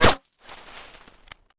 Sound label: snow hit; projectiles; bullethit; Max Payne